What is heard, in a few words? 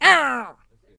Attack Vocals; Tempest Lich